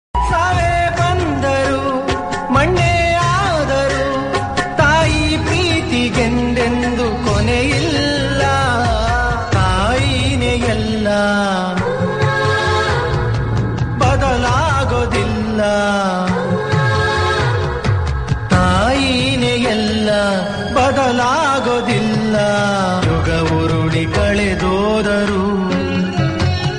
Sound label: Kannada songs